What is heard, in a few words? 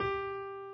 key; keySounds